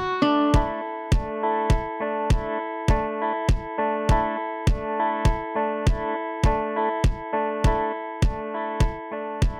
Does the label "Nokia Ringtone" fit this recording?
Yes